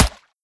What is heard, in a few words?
water, bullet impacts; physics; Half Life Model Pack